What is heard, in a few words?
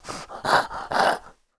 idle, zombie; DayZ-Epoch; dayz sfx